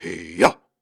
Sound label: Grunts and Chants, Viking Vocal